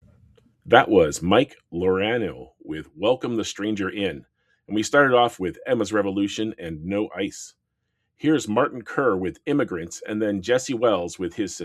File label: Break